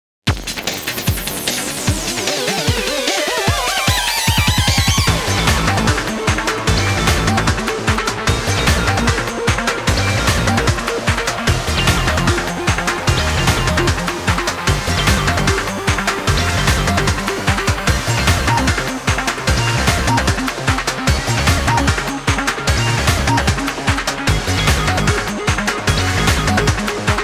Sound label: 8Bit Smasher; Kingdom Hearts III